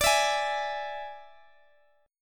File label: D Suspended 2nd Flat 5th D, Guitar, Drop D